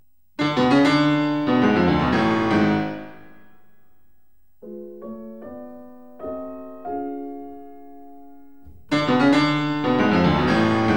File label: Fantasia fis-moll Recitativo, Piano; Wagner